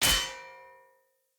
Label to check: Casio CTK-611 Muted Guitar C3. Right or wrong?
Wrong